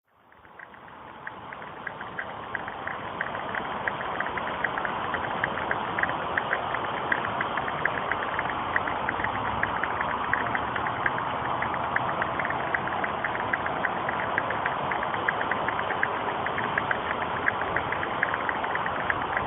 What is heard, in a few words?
morning after rain frogs in little cabbage tree creek